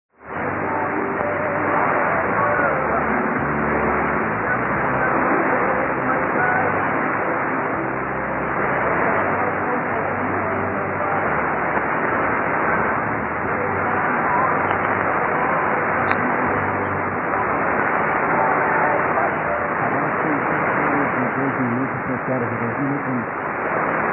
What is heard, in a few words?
6060kHz Radio; ブラジル; Radio Tupi